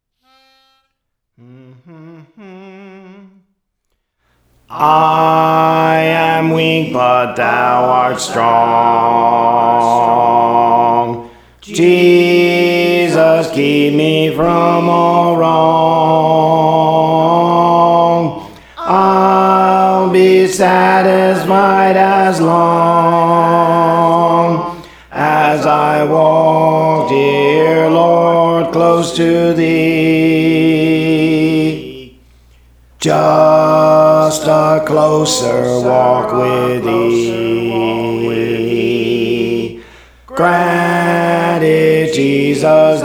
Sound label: Alto; Praise For The Lord Training; Just a Closer Walk with Thee